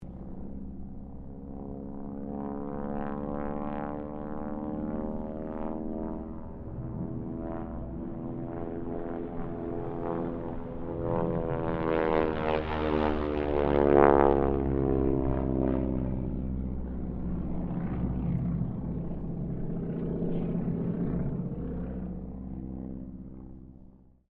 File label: Harvard squadron 4 pass, stereo